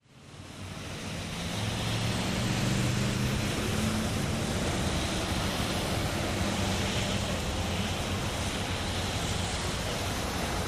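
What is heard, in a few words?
crossroad cars rain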